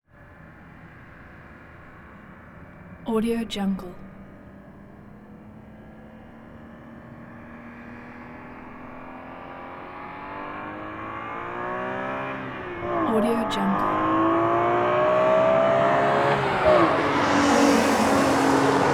2002 bmw m3 car uphill pass by with revving maneuvers; prosoundfx